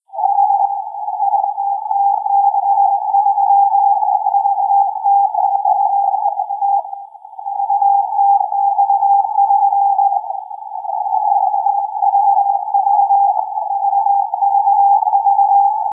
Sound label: 18200 Hz morse; 18.2KHz; VTX-3 (India) Morse